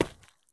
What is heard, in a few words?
sprint asphalt; Escape from Tarkov